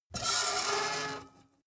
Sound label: metal screech phaser fast